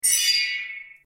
sparkle

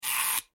spray

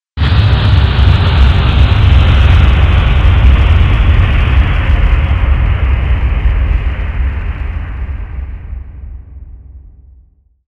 jet 11228 kmph

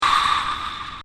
rotation; MarsLander

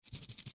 eraser

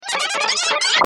undo

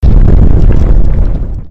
Earth Rumble

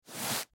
brush

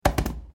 stamp

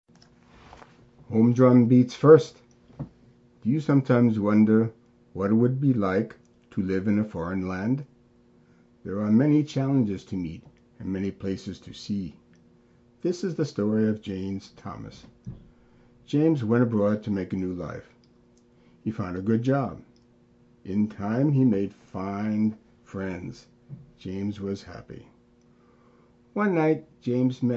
Male Home Drum Beats; READING FOR MEANING

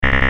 uuu

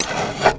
collect food; Juegos Retro; Gauntlet

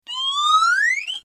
wacky

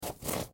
pencil

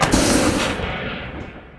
missile launch; Atari Retro

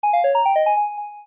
level complete sound; LaserPuzzle

